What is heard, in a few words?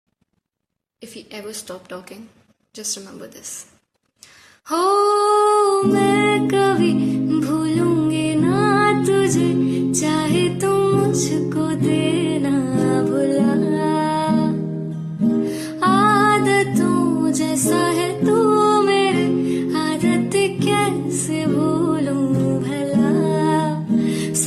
Female Version